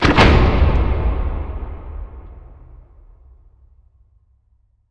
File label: Heavy Metal Door Impact